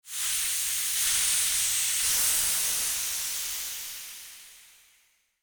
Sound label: Event smoke machine fogger running; machinery